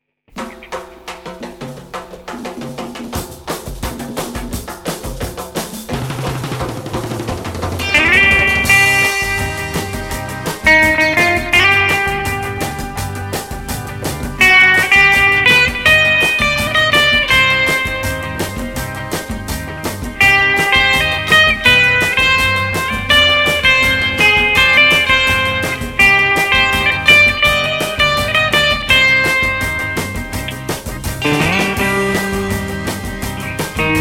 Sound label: Guitar; バイオリン・モズライト